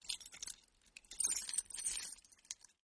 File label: keys jingle